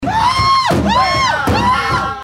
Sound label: scream; busey